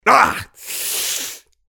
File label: mobster pain sharp